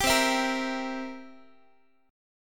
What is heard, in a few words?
C 7th C down Guitar 4A, 4E, 5A, 5D, 5G sharp, 6C sharp; C7 strummed